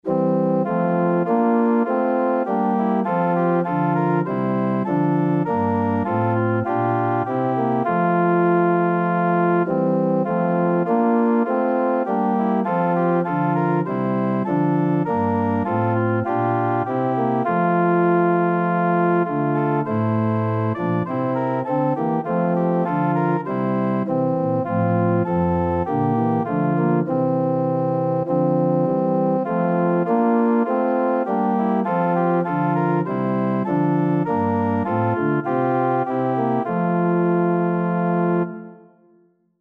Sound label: o little town, organ